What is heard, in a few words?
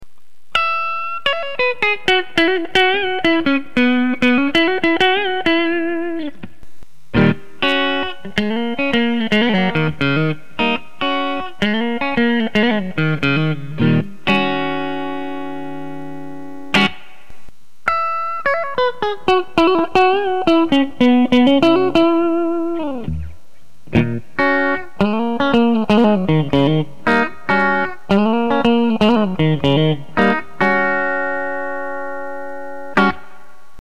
stratmod mid tones